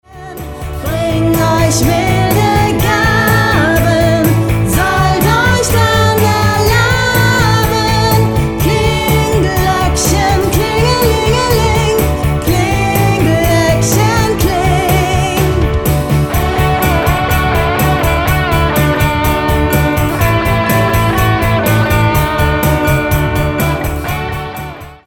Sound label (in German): kling gloeckchen